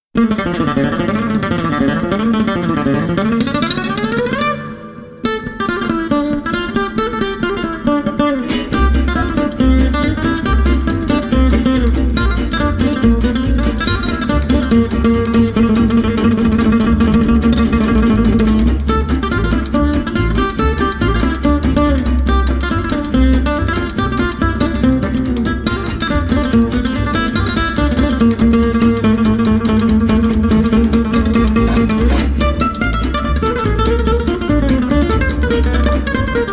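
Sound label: swing guitar sax jazz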